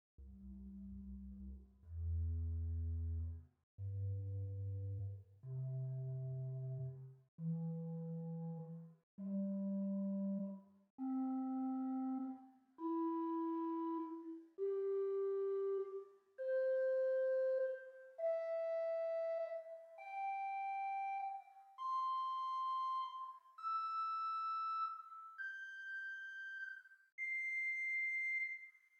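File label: Swell Rohr Flote 8 arpeggio, organ; St Annes Moseley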